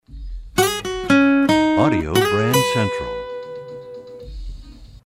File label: Desert Wind, radio jingles